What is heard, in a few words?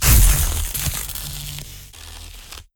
Electricity Burst